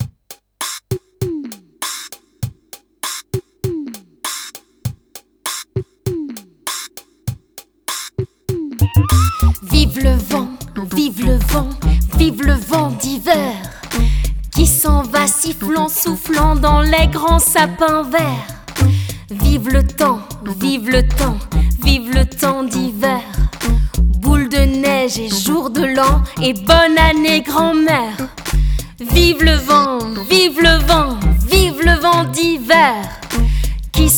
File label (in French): Vive le vent chant, Version chantée